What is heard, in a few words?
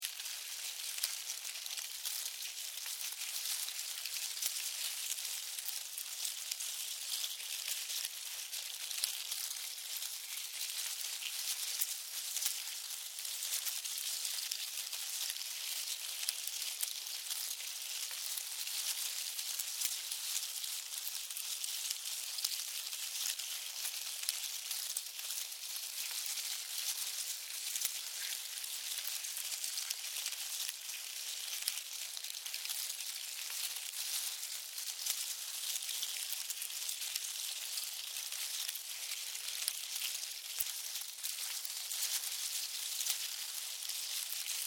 Termites, Termites, Colony, Activity, Movement, Insects, Bugs; Sound Effects:Animals:Insects